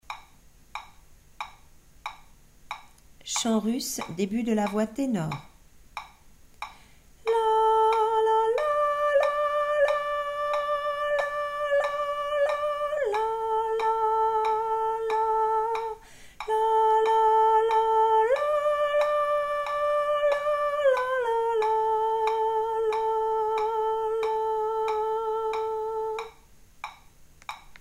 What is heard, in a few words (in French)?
voix tenor; debut voix tenor